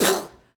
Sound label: Boxing Female Spit Mouthguard